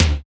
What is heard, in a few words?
Toms